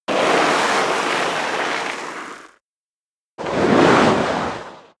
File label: dirt grind; industrie wirtschaft; baugewerbe